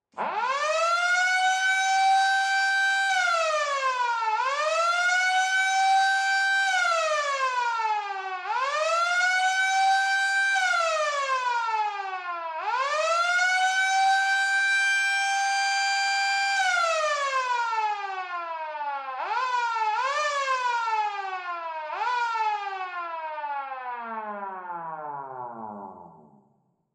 police siren loop; Film Noir; SoundPad

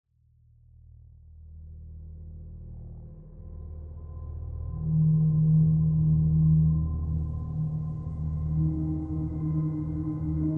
Dark Ambient Melodies

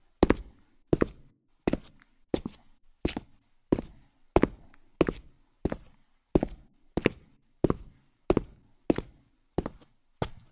footsteps boots loop; Film Noir; SoundPad

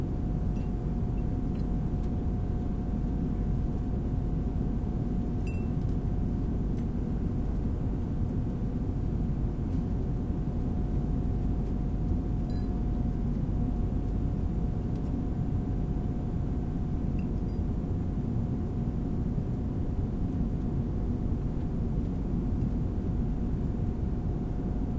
plane interior, backgrounds; Secret Agent

